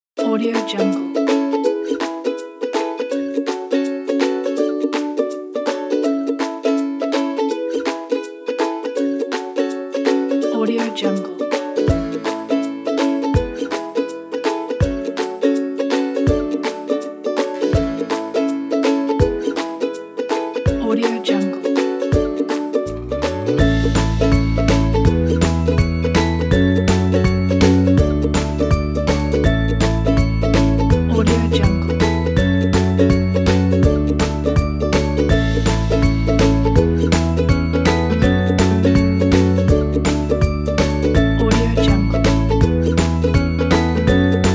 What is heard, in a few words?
be happy